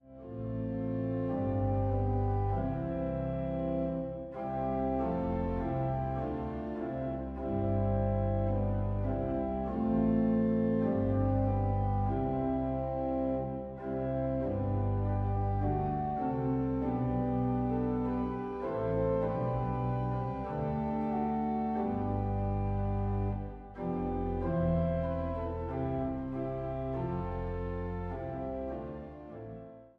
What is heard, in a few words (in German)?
Orgel